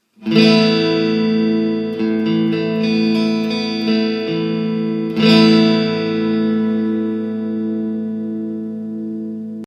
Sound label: asus2, guitar